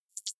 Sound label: click light, signale